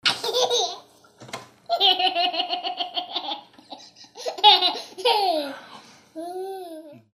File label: Laugh